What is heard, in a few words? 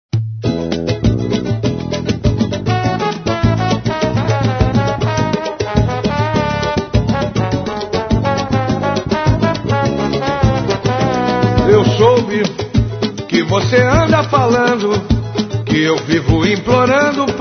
alto la